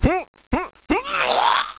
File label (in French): Beuah